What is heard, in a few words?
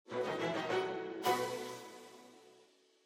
Message tone